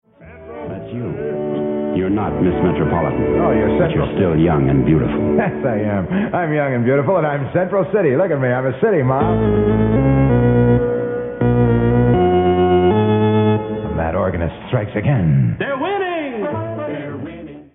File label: mad organist